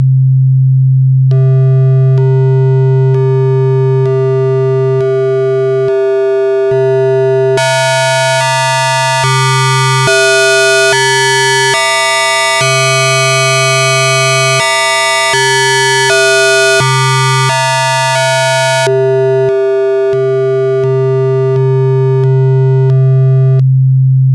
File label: sine varying gain folding; cyclebox